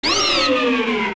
die; Tektite

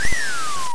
Wisps; OOA; Maku Tree; Zelda; Oracle

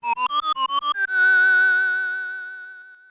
Whistle; 'Whistle' indicating a secret in the room

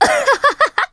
Assistant Laugh; Assistant laughing; Madame Couture's Assistant; zelda

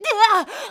Unmerge; Link un-merging from a wall; zelda

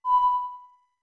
Ocarina B short; OOT

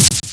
Dodge; Sheik dodging

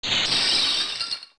Unfreeze; Link un-freezing

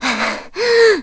Breathe; Mila breathing (while stealing); Zelda

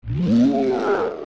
Moan; Armos

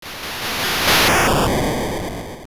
Fly By; Evil Eagle flying by

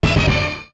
Message Listen; Narrator's message appears

